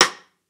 snare rim; one shots; SEISMIC Drum Sample Pack; Slate

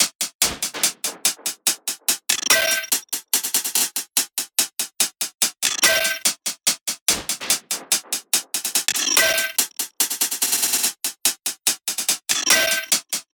tops 144, Drum Sample Pack, Loops, Top Loops; SD SEISMIC; Slate

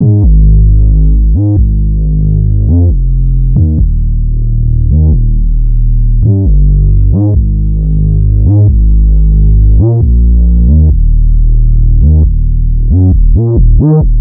Synth loop 135 Ebm, Synth Bass Loops; Slate Digital BASSHOLE Sample Pack

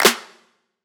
snare oneshot, Pop Sample Pack; Drums; SD PASADENA Pop Sample Pack; Slate

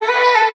vocal autum Am; Slate; AURORA Sample Pack; Vocals

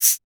percussion one shot; shapes; Slate; SD PASADENA Pop Sample Pack

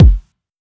Kick, 909 Kicks

